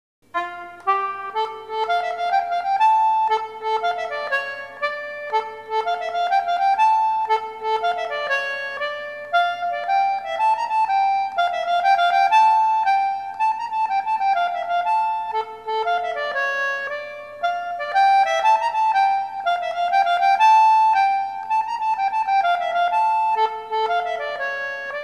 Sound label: concertina; Played in the standard treble range (on my tenor-treble); Lulle Me Beyond Thee